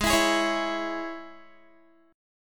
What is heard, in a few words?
G sharp Suspended 4th Sharp 5th G sharp, Guitar; strummed